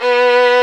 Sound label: STR XVLN, Str Quartet; Viola&Violn